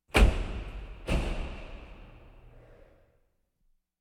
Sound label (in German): parkhaus schliessen mehrerer autotüren; Großstadtgeraeusche